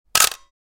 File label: Screenshot pop snap screen; shorts sound effects